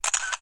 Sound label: Shutter sound